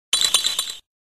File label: vanillites cry; pokemon black and white